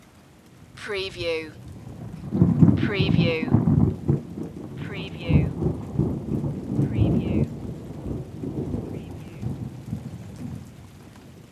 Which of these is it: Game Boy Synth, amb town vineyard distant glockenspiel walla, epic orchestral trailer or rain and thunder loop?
rain and thunder loop